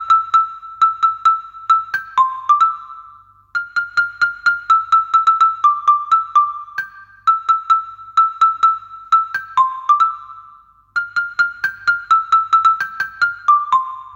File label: Jingle Bells